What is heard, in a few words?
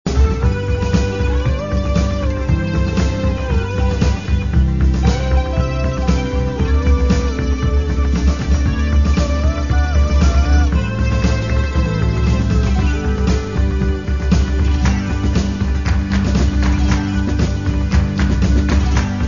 Celtic Drums; newworld